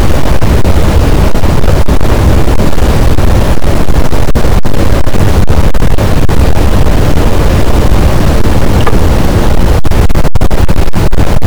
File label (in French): cachalot positif, boat, Physeter Macrocephalus